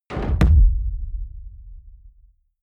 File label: heavy sub noise bright, hits impacts; whooshes rises